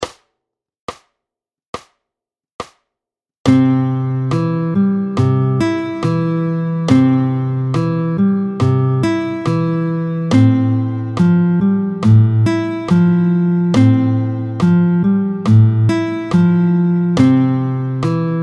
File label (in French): Les doigts tempo 70, l'interprétation manouche; 16-01 Les doigts dans le nez, tempo 70; Le I IV V majeur